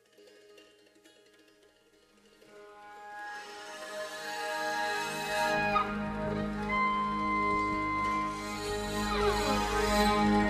Instrumental, Five-String Banjo; American Appalachian Folk Music

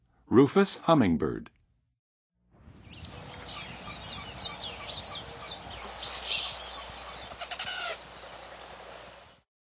Rufous Hummingbird; N. America; Songs of Birds of North America; Western USA Songbirds

